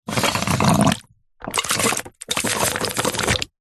foley balloon pump suck in water bubbles gurgle squelch; zapsplat